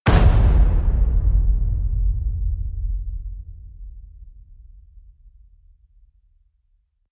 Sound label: hit big spark; brand x music